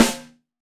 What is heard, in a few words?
Jazz Snare; Snares